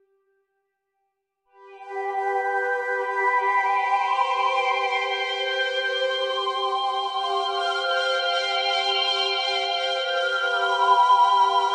Nord G2; Dry Your Ears

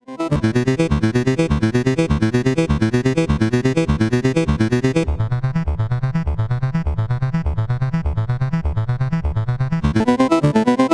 Sonicware ELZ 1 DNA Explorer Synth Guitar Drum

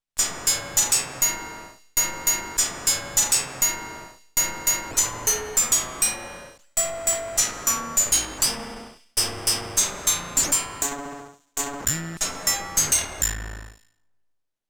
elektron analog rytm hh lab

